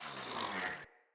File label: Acorn Whizz; Cinematics; Bromius; Bromius Tree Rub; Bromius Quest